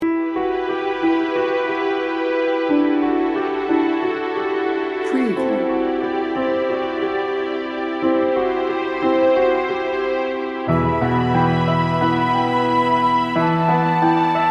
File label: piano dreaming